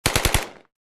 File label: AK 47 firing multiple bursts